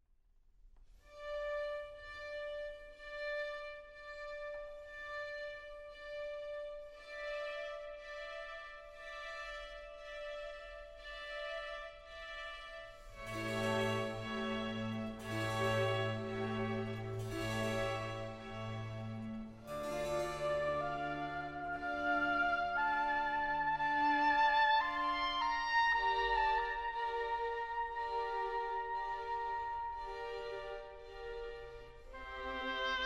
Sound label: Adagio from Oboe Concerto; Marcello